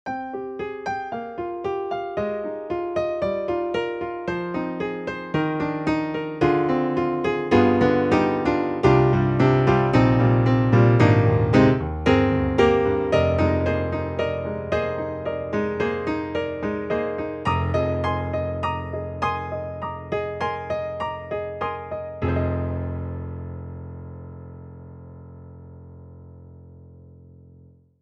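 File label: concert piano; EDIROL; Super Quartet; St.Concert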